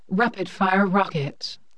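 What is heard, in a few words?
ROCK RIFF; Terra; TERACIDE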